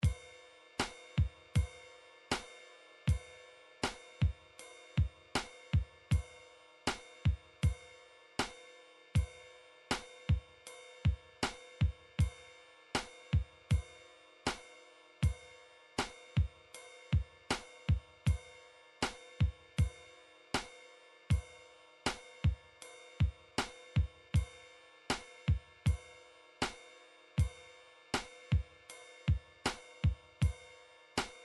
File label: midi drum patterns drums